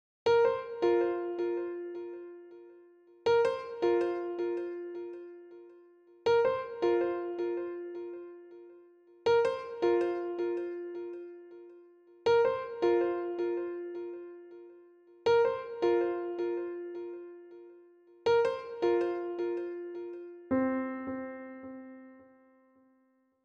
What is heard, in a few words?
piano